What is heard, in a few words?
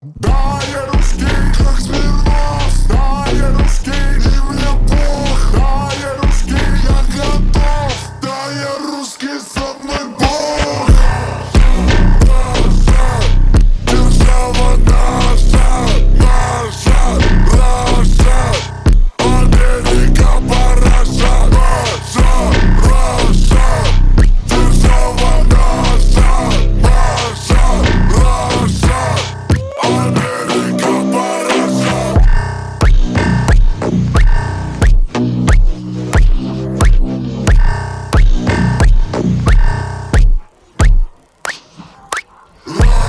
phonk slowed